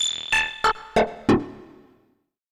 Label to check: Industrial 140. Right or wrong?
Right